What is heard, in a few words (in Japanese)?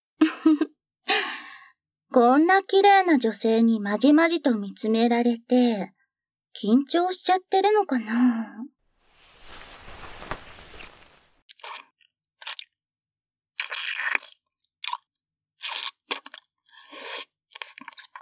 キス＆耳舐め